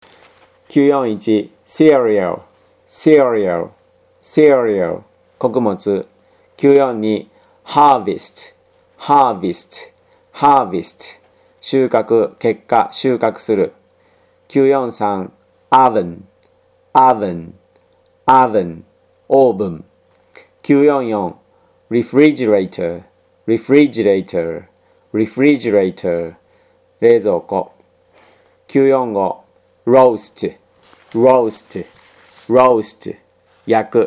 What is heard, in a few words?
tango